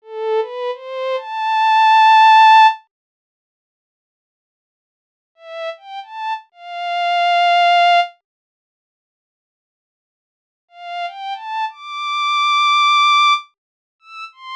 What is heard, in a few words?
Am90 Melody